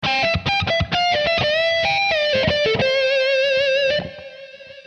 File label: Am2 80-100 solo blues